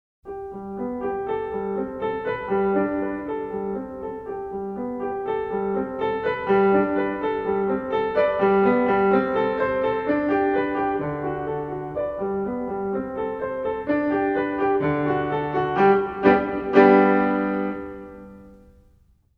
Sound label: Agitato; Marlais Book 1